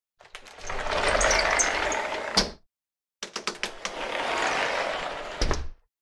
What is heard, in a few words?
Sliding door